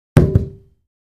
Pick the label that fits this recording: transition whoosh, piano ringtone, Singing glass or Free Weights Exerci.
Free Weights Exerci